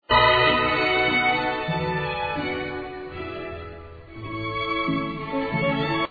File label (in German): walzer